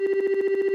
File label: Base Tally Loop